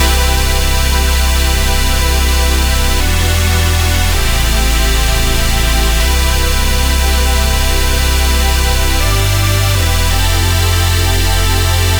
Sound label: supersaw dry; wavefield